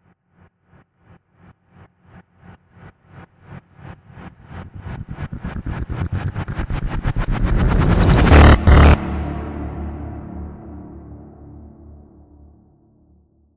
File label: Distorted Rise Hit